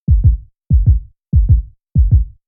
Sting Heartbeat; Brand X Music; Brand X Music Catalogue - Editor's Essentials

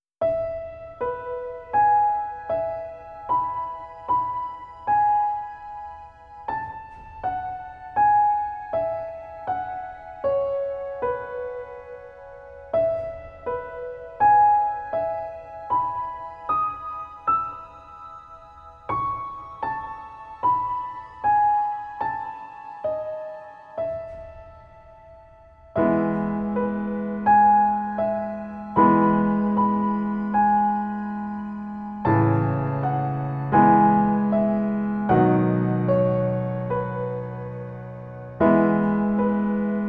Calming Piano Music

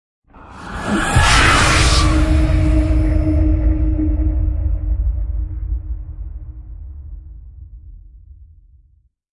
Manifest Scream; Ninja Tracks

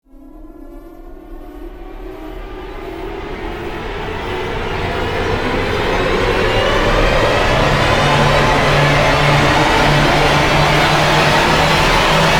Axiom Rise; Ninja Tracks

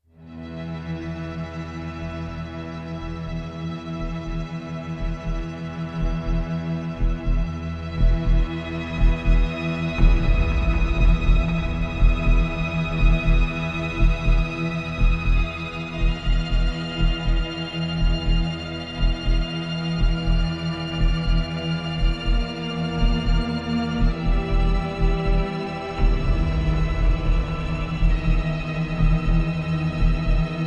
Tension Ambient

